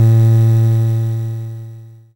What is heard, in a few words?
SID Bass Gm; CHIPSHOP HITS